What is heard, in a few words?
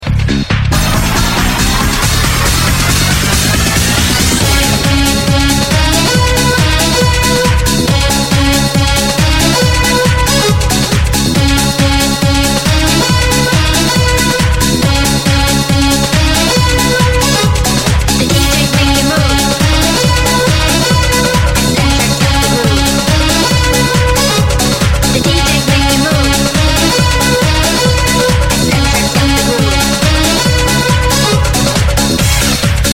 rave